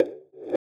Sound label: EQ Perc, Percussion Mix